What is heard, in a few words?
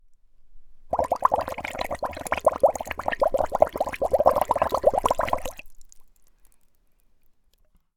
Water Bubbles Wood bowl